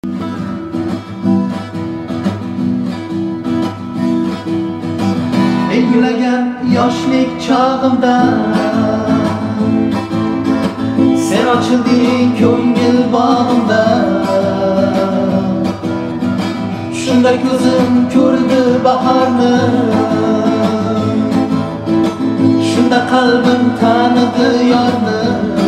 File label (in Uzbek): gitara versiya